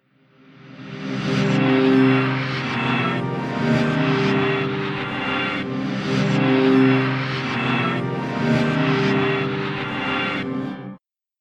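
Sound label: Arps Turntables Acoustic Guitar Medium Dark Plate; PerfectSpace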